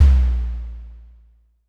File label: Drum X FX, Pearl